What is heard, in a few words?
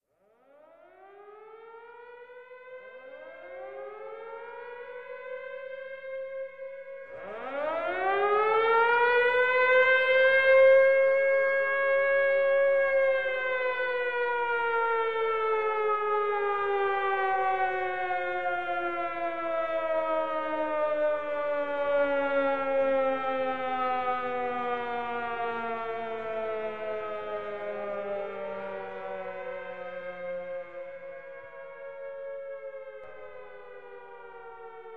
Air raid sirens multiple; Children of the Blitz